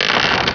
knarren; crow

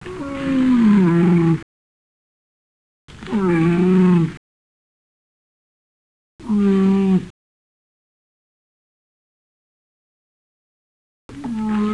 kamel

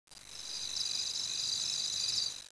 zirpen; cs vicus

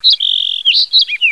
vogel

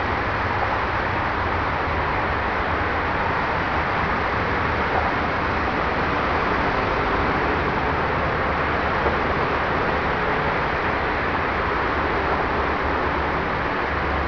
strassenatmo; de pandemonium

